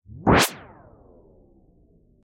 launch; gingerman rescue

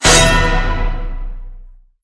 combo; fruit break3

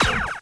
laser; johnny upgrade

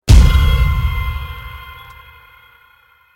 destroy; moon defense

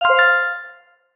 combo; merge fish

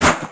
pistol; war zone

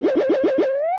attack; dexomon2

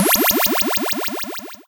unlock; flying triangle

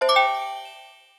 win level; puzzle blocks

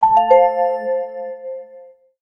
win; gingerman rescue

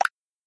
button click; puppet hockey

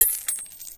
coin drop; fidget spinner master

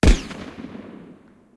gunshot and echo long; deep worm

